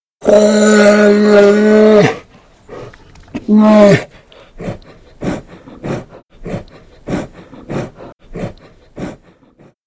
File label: Roar Breathing Mid; Bear-Trap elements; wildscape